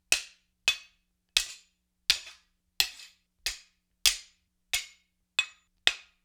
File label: Axe Norse Sword Blade on Blade; StillNorthMedia